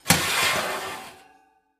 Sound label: Oven Rack Pull Out; appliances